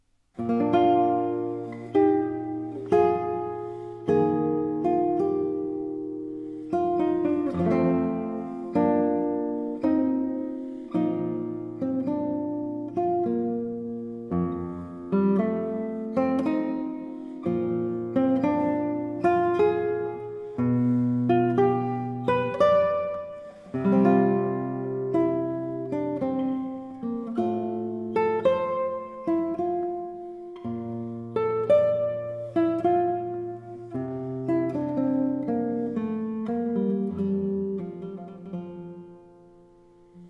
Cello Suite No 4 in E Flat Major, guitar, Sarabande; Bach Transcriptions and Arrangements for Guitar